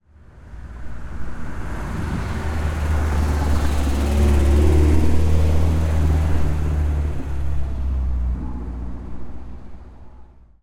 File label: old car short passby; fvtt sonniss gdc audio